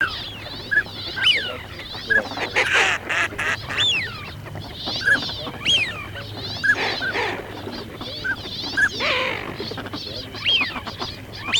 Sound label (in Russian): Шилохвость Codone Свиязь Fischione; plurifon